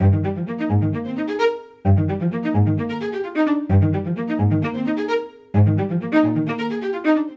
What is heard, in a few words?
Ostinato Fluttering Eb Major 130 bpm, string quartet; I i-IV; MIDI Clips; Ableton; Spitfire Audio